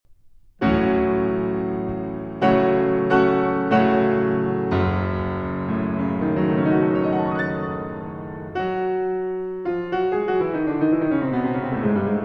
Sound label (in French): Piano et Violoncelle; Grand Duo Concertant; sur des Thèmes de Robert le Diable, B. 70